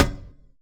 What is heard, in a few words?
impactPlate medium